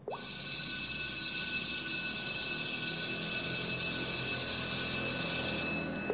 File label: School Bell